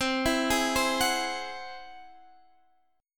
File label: G sharp Augmented 7th C